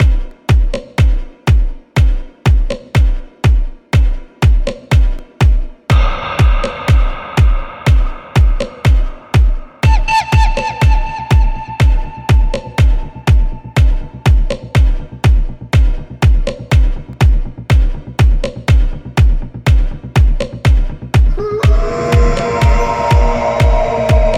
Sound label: indie to melodic techno; autumn session